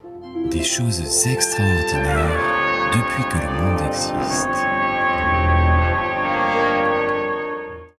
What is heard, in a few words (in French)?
jingle; Des Choses Extraordinaires